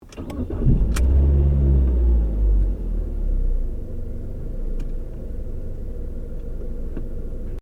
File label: Car Ford Focus Ignition Interior